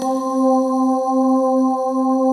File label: BELLY SYNS, SYNTHS; Pro-Rec - Synthsonic